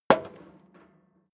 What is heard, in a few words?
step leather shoes metal rattle single left; schritte